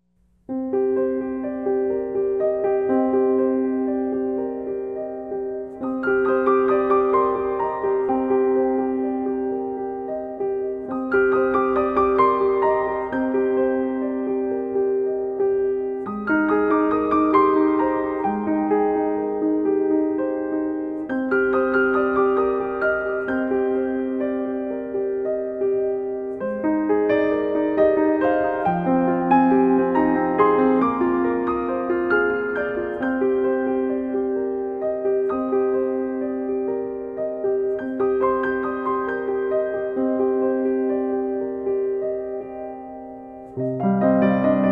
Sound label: Wind Solo Piano